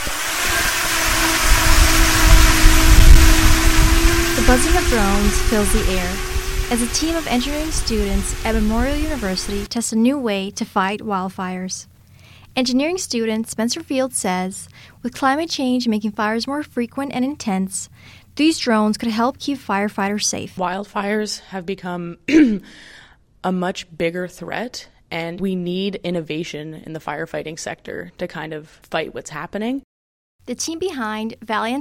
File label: drone; mun students developing drones to fight wildfires